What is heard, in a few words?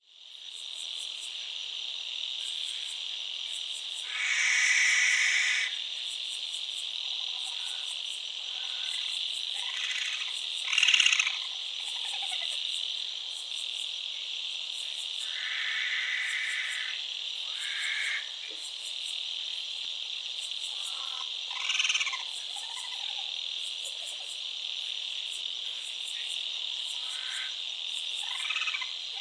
Masked Owl pair, Yampi